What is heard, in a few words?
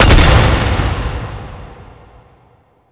Two Hand Stomp; Attacks; Warframe; Hyena